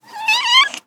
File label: Kitten Meow; SES Animals SFX